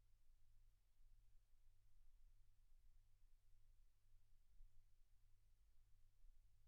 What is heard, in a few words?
silence 48kHz